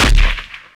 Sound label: Rifle Fire